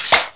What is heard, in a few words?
pop